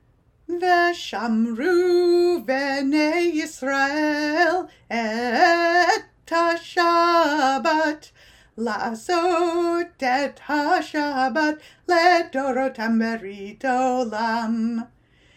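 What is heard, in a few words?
Veshamru chorus, Group Songs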